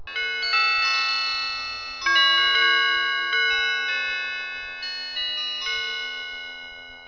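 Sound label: wind chimes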